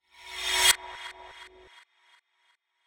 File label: FX Feedback Reverse